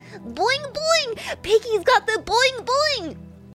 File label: boing boing